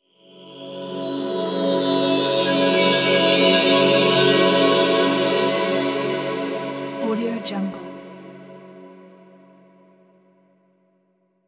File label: enigmatic intro transition